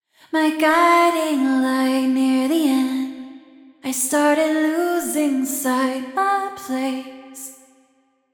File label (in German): Vocals Pre EQ und Reverb EQ